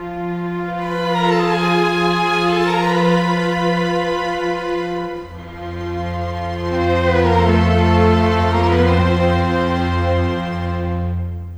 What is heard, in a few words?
Cinematic Strings, Orchestral